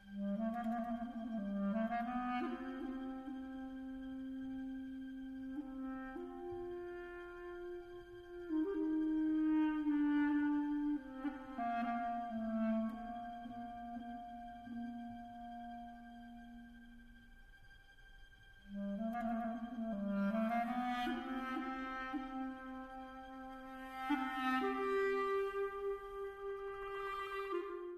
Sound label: Clarinet Doina sample